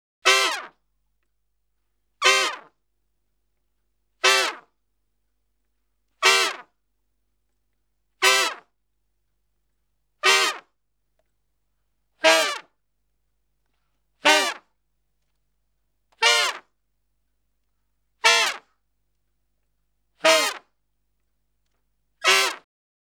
Salsa Picante; Masterbits; World Zone VOL-3

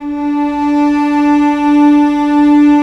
cello D3; AKAI S-Series CD-ROM Sound Library VOL-2

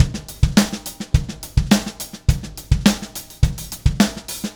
Clinical Beat, Indie Rock; Sonic Foundry (Sony Creative Software); Indie Rock Anthems; Session 02 - Clinical

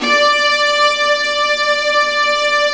Violas Bright, String Sections; Roland LCDP13

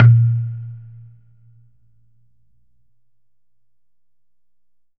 COCONUT WET; Q Up Arts; Denny Jaeger Private Collection

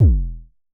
Kicks E1; Vermona Kick Lancet; LookIMadeAThing Kicks; Festival Kicks; Drums

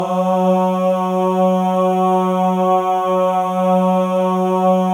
MALE AHS, Voices; Spectrasonics

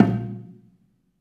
bela A#1, belafon; USB Soundscan vol.60; AKAI

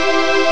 choir maj; Amiga Soundtracker Sample Packs

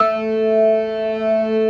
organ synth

